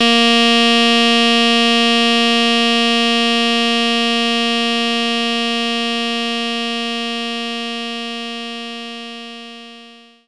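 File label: Alto Sax Bb3; knights try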